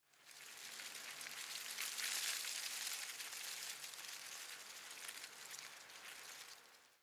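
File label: shrub small leaves rustle soft, garden; haus-garten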